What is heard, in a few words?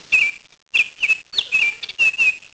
oriole